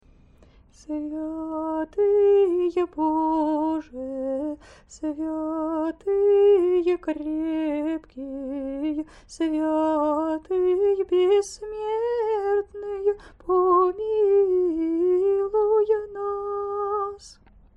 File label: trisagion old bulgarian, singing